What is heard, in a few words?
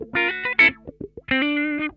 LICK, Funk Guitar; 90 s Sample CDs; Zero G